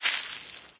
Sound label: grass, player footsteps